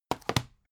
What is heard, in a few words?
dvd case put down